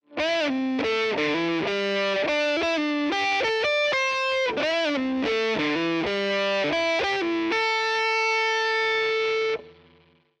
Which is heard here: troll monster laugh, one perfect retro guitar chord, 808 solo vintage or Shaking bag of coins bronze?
808 solo vintage